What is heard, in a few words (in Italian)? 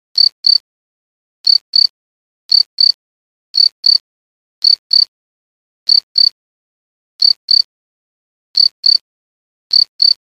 grillo campestris